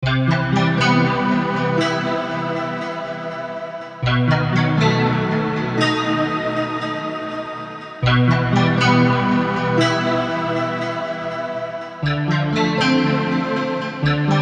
neon reverb guitar

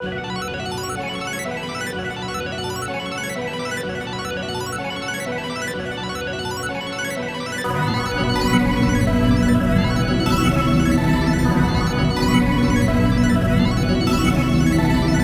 Melody Loop Bmin 126 BPM; Trapify; Matterhorn

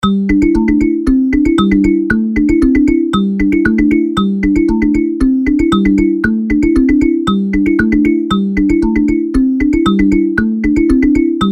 African Kalimba

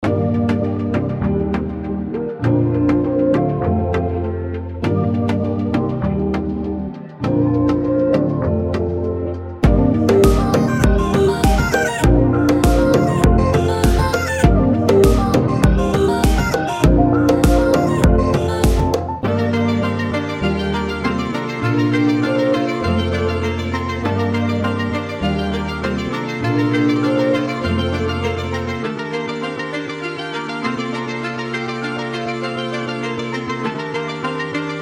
Smokey Opera 100 A maj; Aetherpop

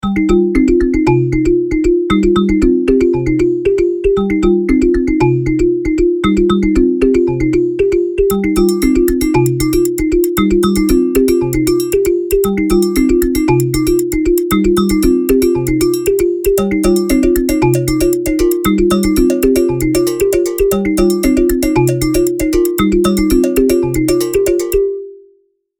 African Kalimba

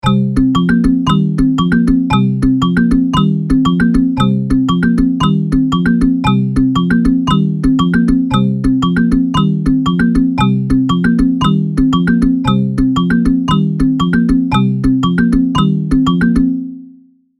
African Kalimba

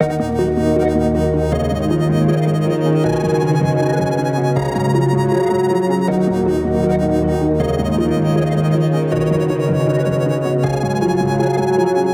Melody Loop Dmin 158; Trapify; Sabertooth

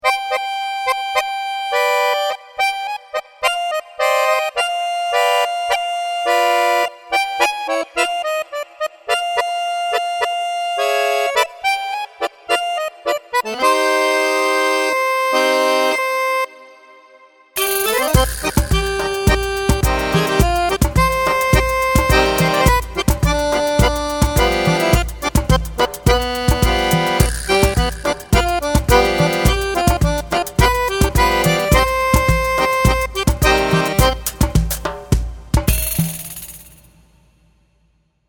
Tango, tango accordion

